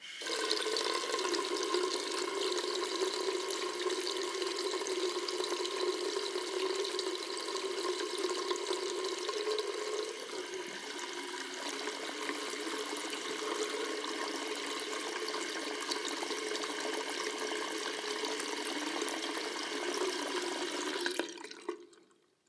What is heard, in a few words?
sink; liquid; NS Studios - ultra sound collection